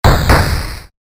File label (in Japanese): 巨大ロボット 歩く 二歩 モノラル